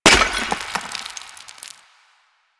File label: Destroy Planter Hanging; Warframe; Ambience; Nineteen Ninety Nine